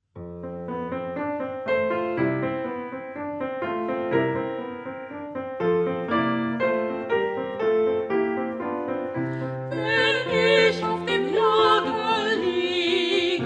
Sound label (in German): Abendlied